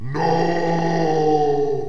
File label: falling